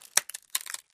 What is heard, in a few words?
Panel Splinter, breaks & splinters